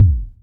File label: Kick, Roland MV8800; PLUTO KIT